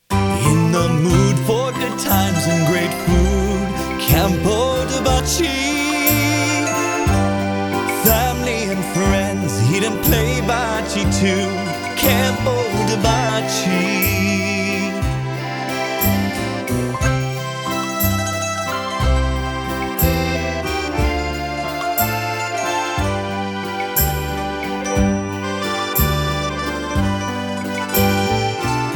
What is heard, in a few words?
Italian, restaurant jingles; Campo Di Bocce